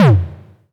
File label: Kicks, Elektron MachineDrum SPS1 MKII